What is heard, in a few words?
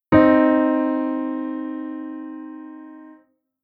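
Chord Interval Minor 2nd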